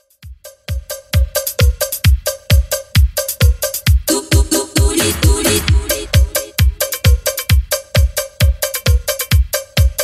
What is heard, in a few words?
80s Extend Redrum 132bpm